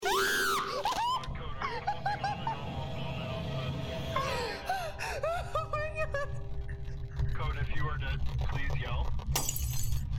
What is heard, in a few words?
scream